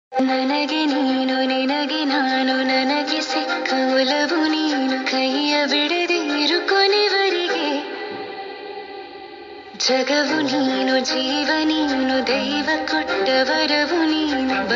kannada new song ringtone; chikkanna; upadhyaksh movie song

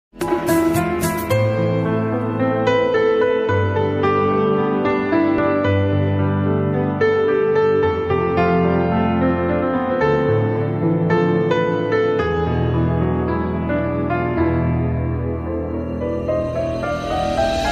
instrumental ringtone sad piano ringtone

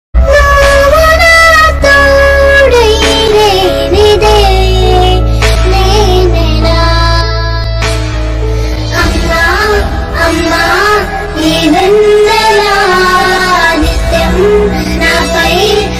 cover song

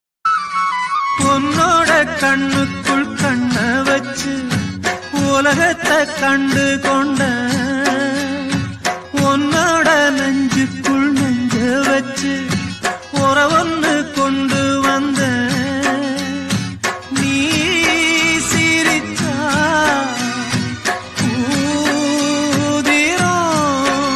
love failed songs in tamil

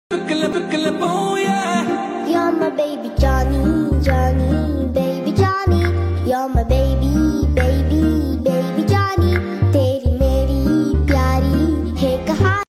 Punjabi love ringtone

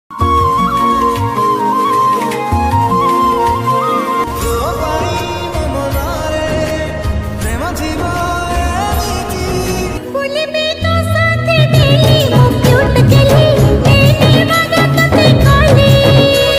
odia new song ringtone odia romantic song

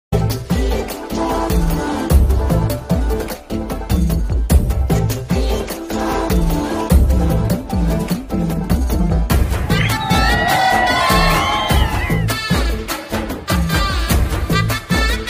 naa saami ranga new bgm ringtone

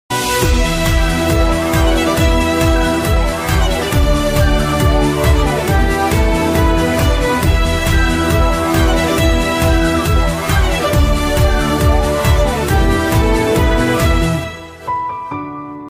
kannada bgm romantic ringtone; rachita ram

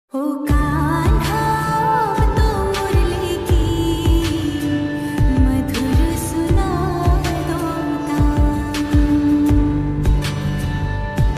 slowed reverb lofi ringtone

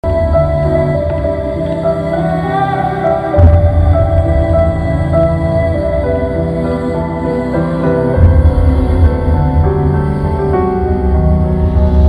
emotional bgm ringtone sad bgm song ringtone; the family star movie

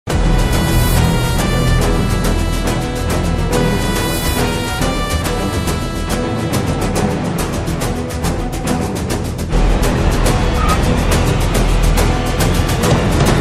trailer bgm ringtone; Drushyam 2

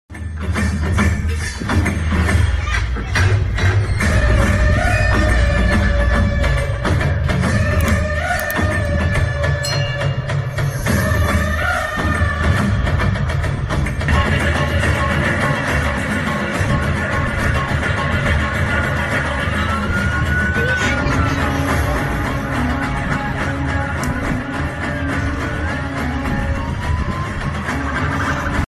bgm ringtone; raayan; dhanush

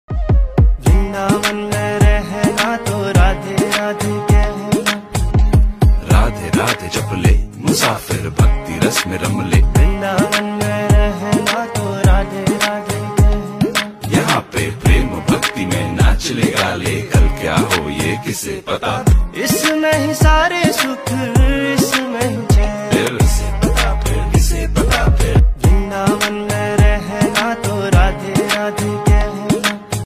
anthem ringtone hindi song ringtone; Vrindavan

